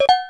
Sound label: Bullet Bill Collision; New Super Mario Bros. Wii